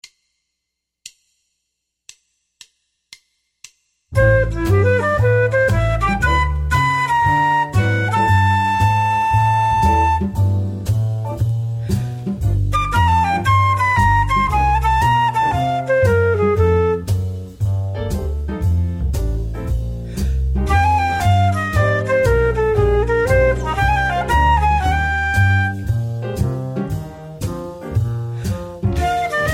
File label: two five phrases